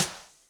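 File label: Brush Swirl Rim, Electric Snare, Yamaha DTXpress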